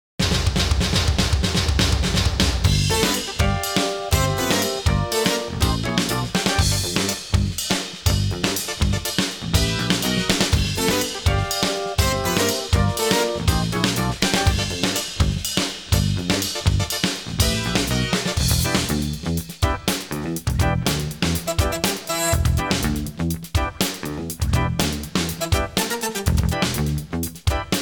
funk set trash, Drums; Sound Sampling Collection VOL-5; Drums & Bass Fusion Funk Effect

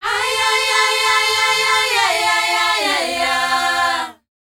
AYEYAYA, World Voices, S. AFRICAN; ILIO; Vocal Planet

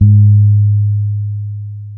bass, G#3; Spectrasonics; Bass Legends CD 1

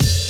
KIT, Dance Mega Drums, HIP; Best Service

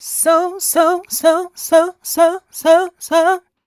f 126 mel f-sharp, fem Vocals

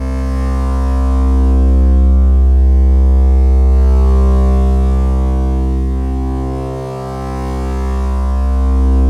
reverb synth C2; Zero-G Ambient 1

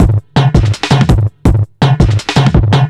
165, Drum Loops, Drum & Bass; Total Drum&Bass

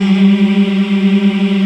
VOX African; Roland LCDP11 Africa VOL-1

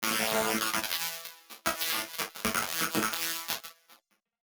Battered Synth; malfunctioning synth samples

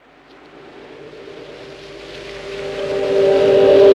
Wind Rise; Zero-G Jungle Warfare 1, 2 & 3; 90 s Sample CDs

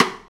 Beguine, Percussion; Roland BK-5

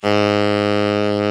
TENOR SAX G#

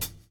GRV, Groove; AMG Gota Yashiki Groove Activator

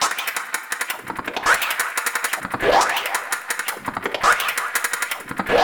spanish flu, Drum Bass Drumloops 170bpm; Zero-G; Total Drum Bass

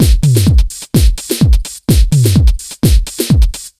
127 BEAT, Fresh Disco House; USB Soundscan vol.20 - Fresh Disco House I

